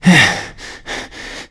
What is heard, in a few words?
player climb breaths; Call of Duty Modern Warfare